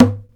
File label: SHEKO MUTE, ETHNIC PERC; InVision Interactive; Lightware VOL-1